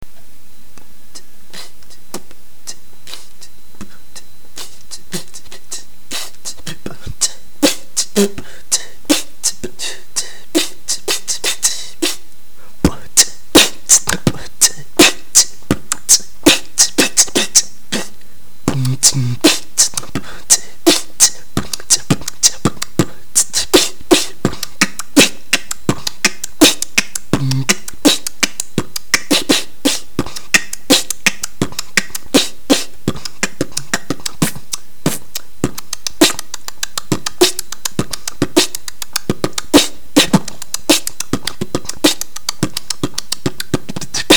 new snare

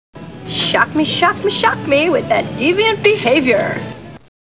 shock

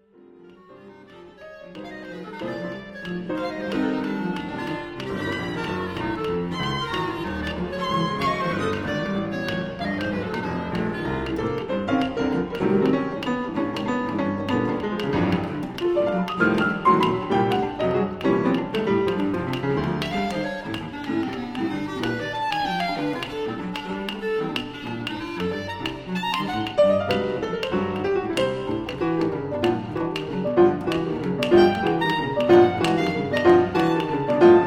chromatic

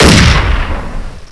SMG

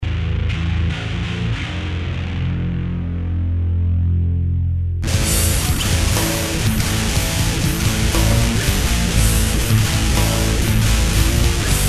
underwater breath

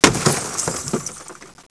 autowind, derrapadas; cidade

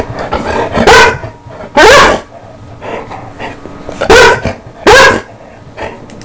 bok bark

